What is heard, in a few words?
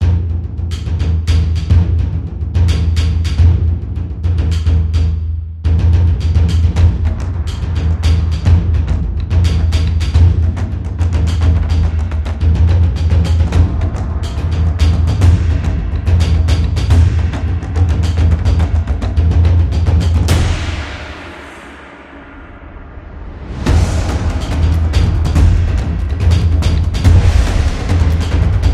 Taiko